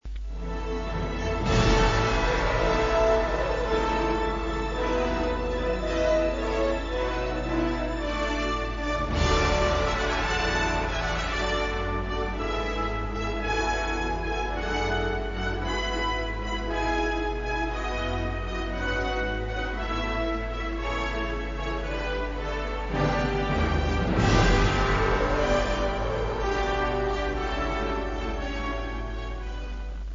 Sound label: fanfare; troy